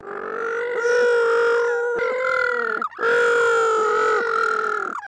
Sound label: Little Blue Penguin